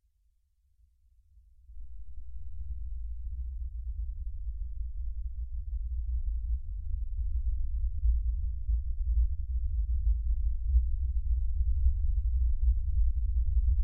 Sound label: Earthquake